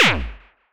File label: Superkick Fx